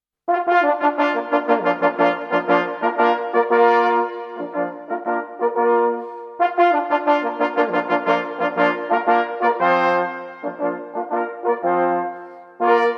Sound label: historic quartet